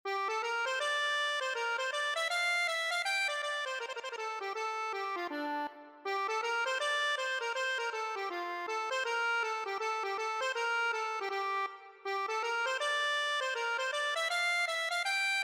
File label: lamentation, accordion